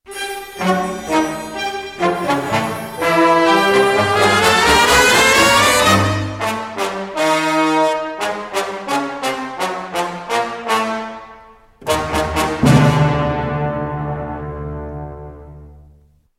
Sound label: dramatic cue e